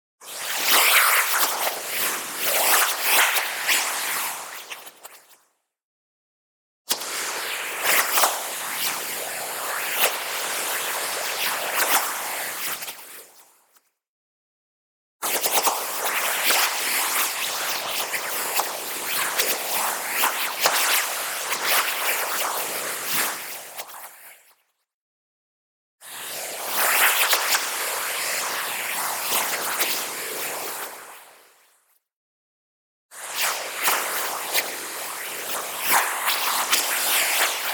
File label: movement long, white noise spin whirlwind; boom library; Anime Essentials